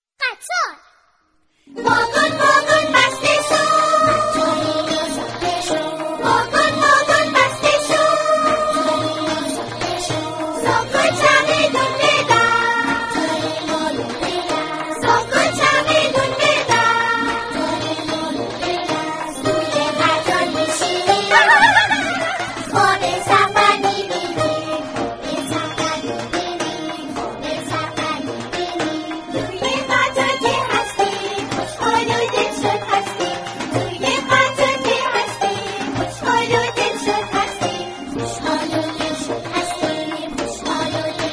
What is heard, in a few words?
Music Guitar Music, Child Music